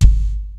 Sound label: PHAT TRAX KIT, DRUMS; Kid Nepro; Elite